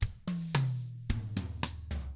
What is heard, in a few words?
COUNTRY, Drums; 111COUNTRY